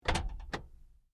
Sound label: Boot Open, car; Hyundai Accent 2008; Hyundai hire car; Vehicles